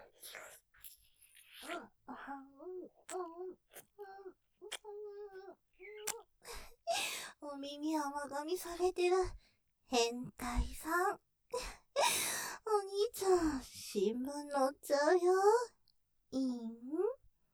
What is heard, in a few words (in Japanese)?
舐め はむはむ系 お耳甘噛; お耳甘噛されてるヘンタイさんｗｗ; ステレオ